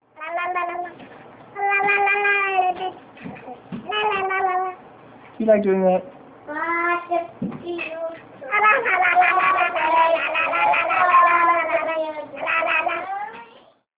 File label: noises